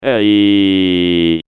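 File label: pyro pain critical death; johnmadden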